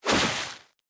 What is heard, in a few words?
Red Bush